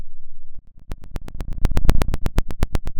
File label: Sound of a fire crackling